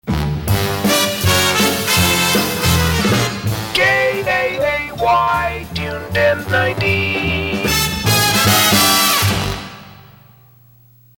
Trombone Signature, Instrumental with theme and signature; KAAY; PAMS Series 17